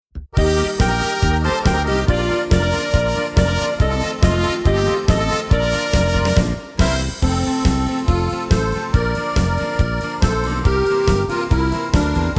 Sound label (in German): Oberkrainer Polka Medley